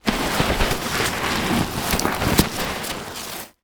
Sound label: Impact Object Heavy Object Dragged Through Dirt; Impacts